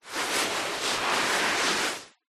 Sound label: human body clothed move shuffle carpet